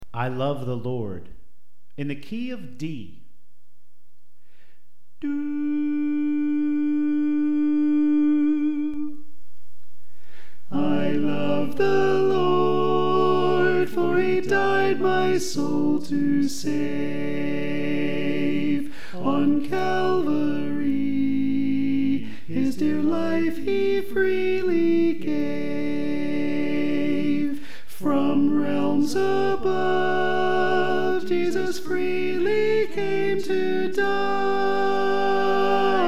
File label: Alto